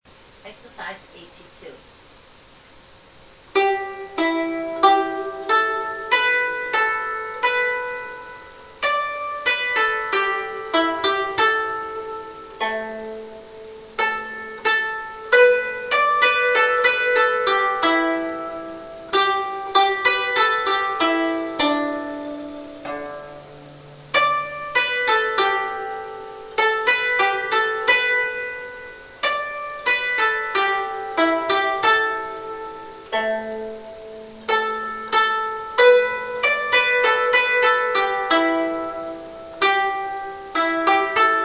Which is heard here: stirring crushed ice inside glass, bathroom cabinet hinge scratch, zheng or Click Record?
zheng